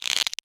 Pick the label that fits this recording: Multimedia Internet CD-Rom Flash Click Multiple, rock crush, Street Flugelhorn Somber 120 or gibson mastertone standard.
Multimedia Internet CD-Rom Flash Click Multiple